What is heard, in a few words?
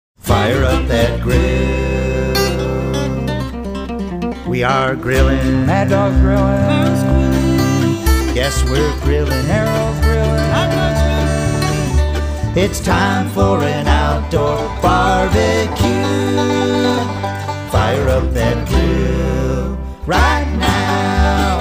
banjo